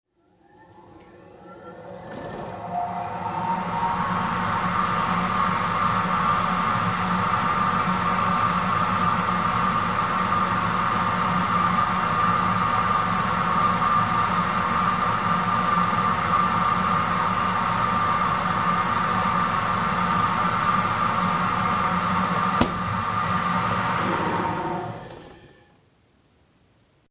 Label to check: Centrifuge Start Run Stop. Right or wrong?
Right